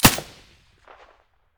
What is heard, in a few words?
silencer; weapons; pp1901; vityaz